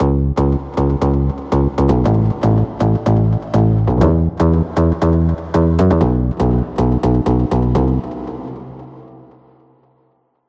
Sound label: BASS SLAP